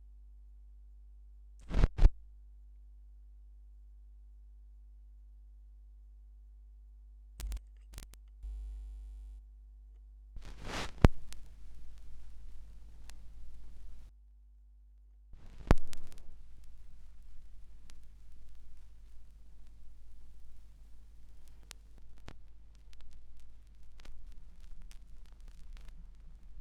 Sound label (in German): schallplattengeraeusche